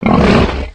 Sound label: boar aggressive